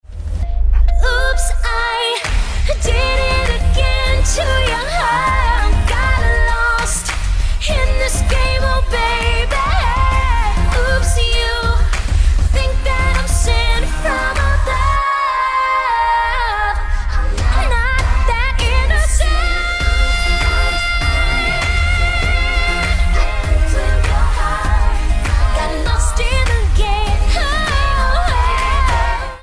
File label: oops glee chorus